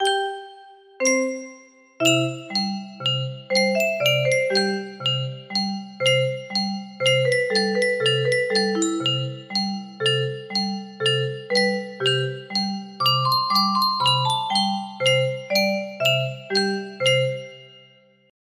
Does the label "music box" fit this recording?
Yes